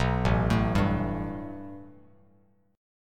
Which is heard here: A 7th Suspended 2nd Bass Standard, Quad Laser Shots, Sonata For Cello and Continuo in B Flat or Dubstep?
A 7th Suspended 2nd Bass Standard